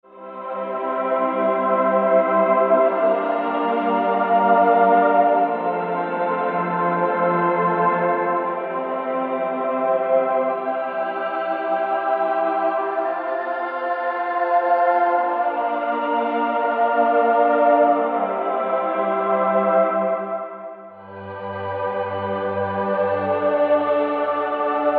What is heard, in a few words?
Mellorkestra,Roland SRX-07 Ultimate Keys